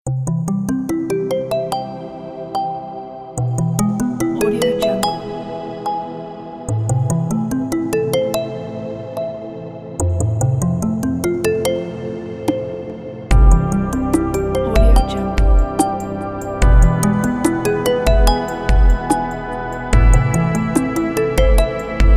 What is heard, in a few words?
underwater alien world